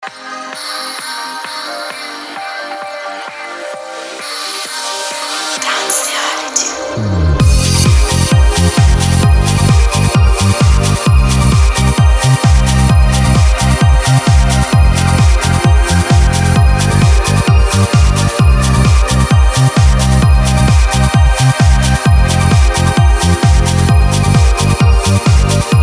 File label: ringtone